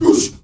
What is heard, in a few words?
MALE WAR, SHOUT; Heart of Africa; Spectrasonics; Heart of Africa 1; CH. SHOUT2